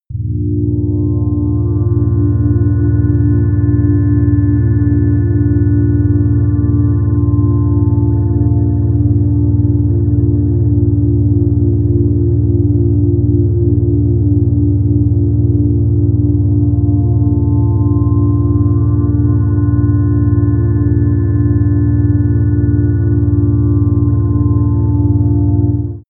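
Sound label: hallow air, pads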